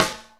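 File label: snare waver, drum machines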